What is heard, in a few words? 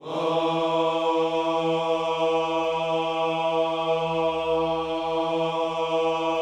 WHOA E 3, Vocal Planet Gospel; Spectrasonics; Vocal Planet Vol.1 Gospel; akai